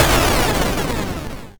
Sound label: explosion, Retro Sounds; Kenney's Sound Pack; Retro Sounds 2